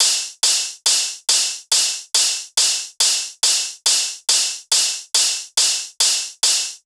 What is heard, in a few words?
SEISMIC hats 140; Hat Loops; drum sample pack; Dubbi; Slate